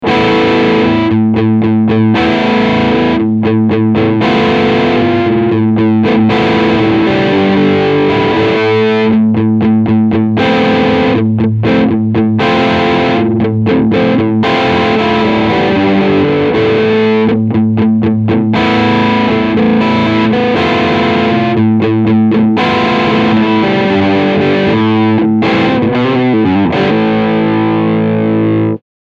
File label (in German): Crunchsound beide PUs